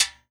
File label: Guira, World Percussion; Cymatics; PHARAOH Beta Pack; Pharaoh Premium Drum Samples (BETA)